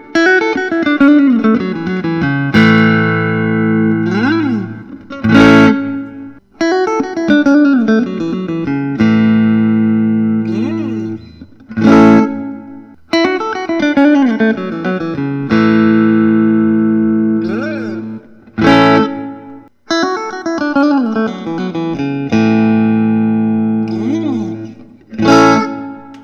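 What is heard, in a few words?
Fender custom shop masterbuilt charcoal; todd krause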